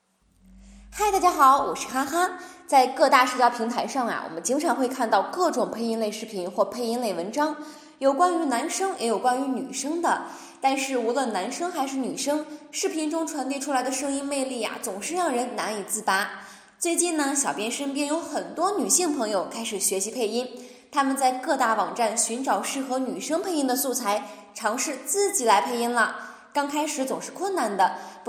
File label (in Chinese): 欢快的哈哈